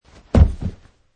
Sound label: Landing